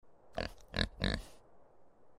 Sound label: pig; Farm